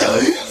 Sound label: ughhhh